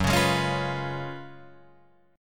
F sharp Major 7th Suspended 2nd Suspended 4th, down, Guitar, Drop D